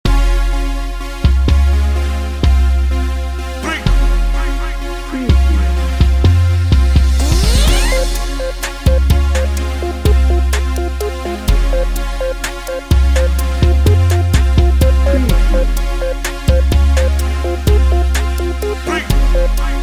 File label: smooth chill